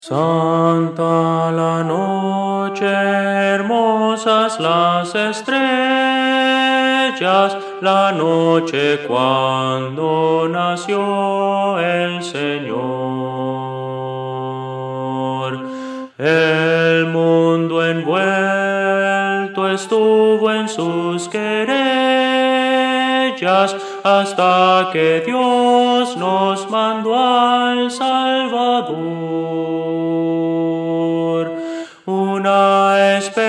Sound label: soprano